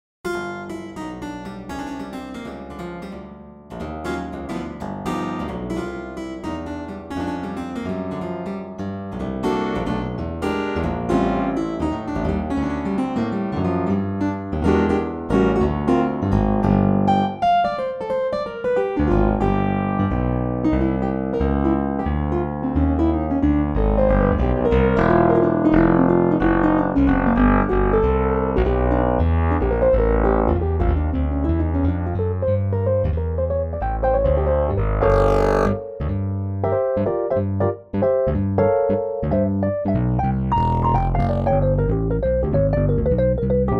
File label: blues morphing, pianoteq